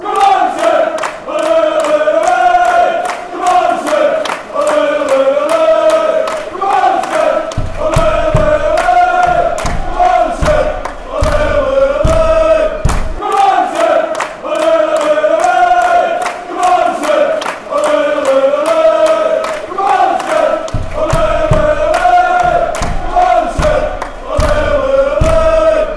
ole, fanchants

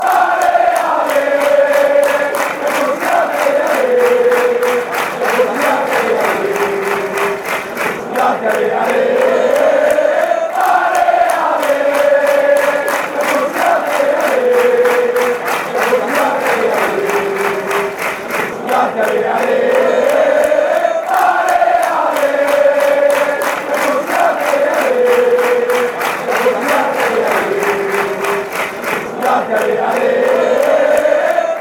ale, fan chants

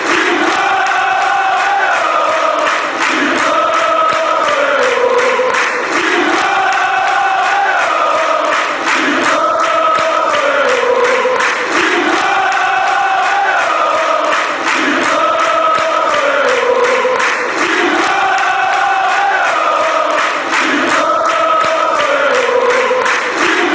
timao eeeeee oooooo

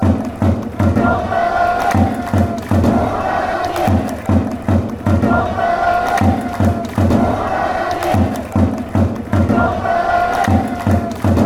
japanese chant

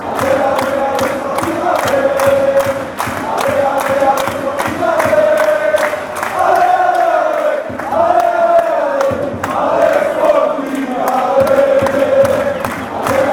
allez allez allez allez, fan chants; sporting

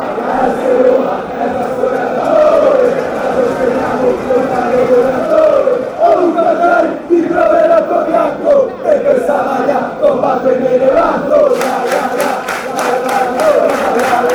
lalala

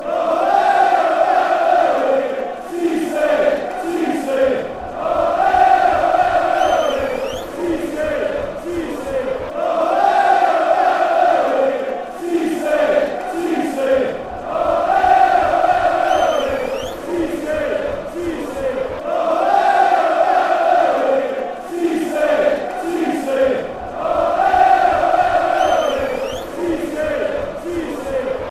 ole, chants; cisse

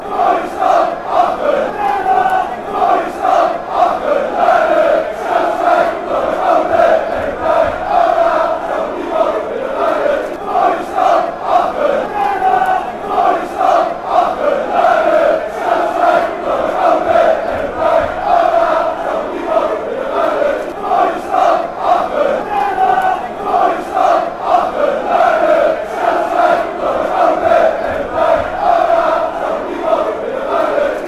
den haag chant

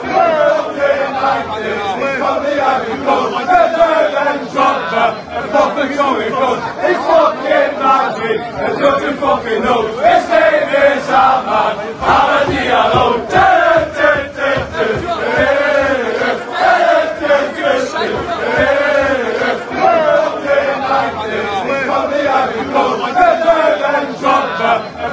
player chant; amad diallo; manchester united

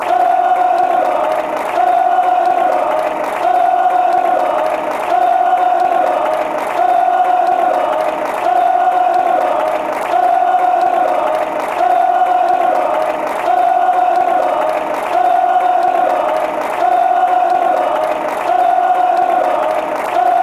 zoma fanchants

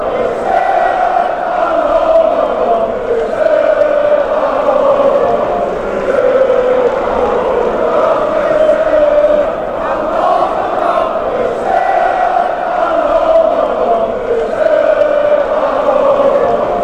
youre sit fanchants

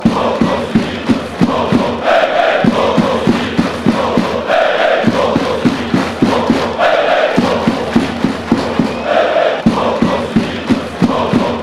hey hey goal goal fan chants

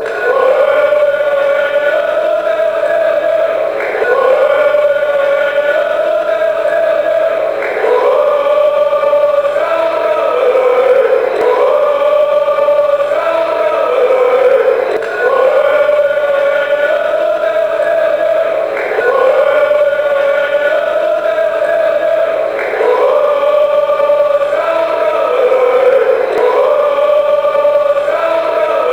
chants; south melbourne ole

